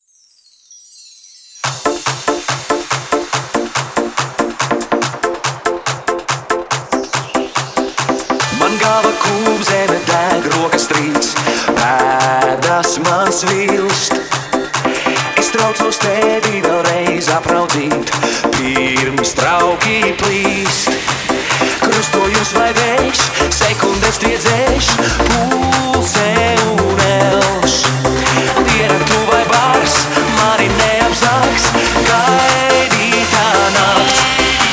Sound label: jumpstyle remix